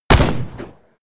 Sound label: revolver close shot; schusswaffen